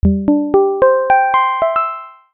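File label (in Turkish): teknolojik açılış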